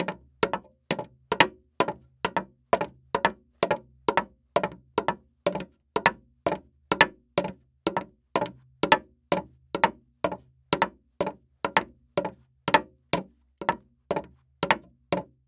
Foley Footstep Cowboy Boots Fast Walking On Wood Board Walk; Foley Footsteps